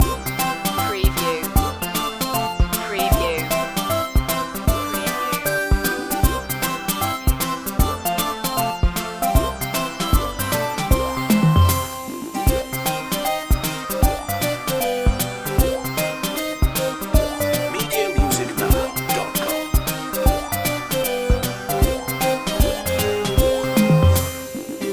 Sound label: retro video game pipe world loop